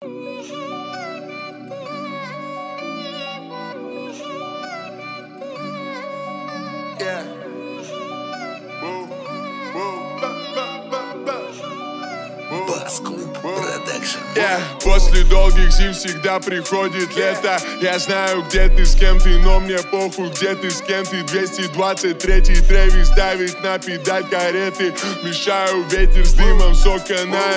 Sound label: 26-29Hz Bass